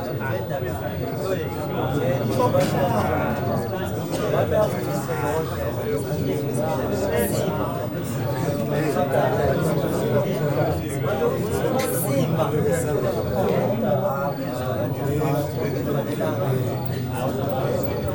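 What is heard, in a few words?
AFRICAN CROWD; Spectrasonics; Heart of Africa 1; AF.CROWDS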